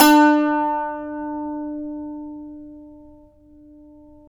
SAZ; Roland - LA Composer vol. 3

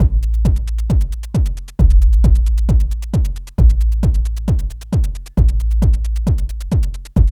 LOOP, Tekno Industrial; East West

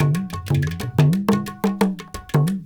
India, Heart of Asia

